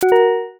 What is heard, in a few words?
ui save chime